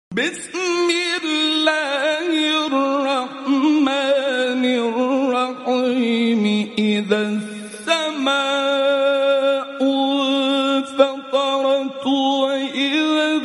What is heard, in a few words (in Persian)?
نفس طولایی در تلاوت سوره; انفطار